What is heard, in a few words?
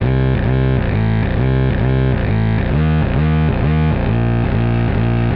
buzzy, Electronic Loops